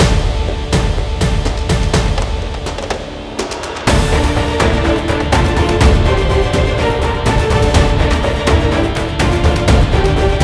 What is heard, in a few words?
tension warrior guerrilla guitar comp; gerilim; By Burak Sound Bank; tension vol 17